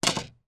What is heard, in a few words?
pool snooker cue down on table; sport